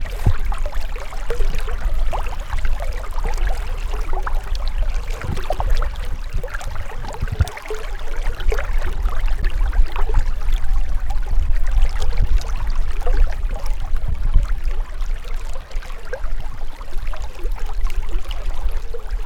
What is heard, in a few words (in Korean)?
물소리, beach